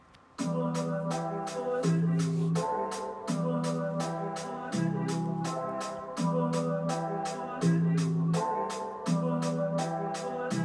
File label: rap